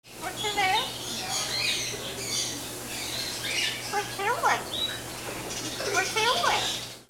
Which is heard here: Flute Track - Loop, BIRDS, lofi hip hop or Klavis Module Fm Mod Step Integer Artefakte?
BIRDS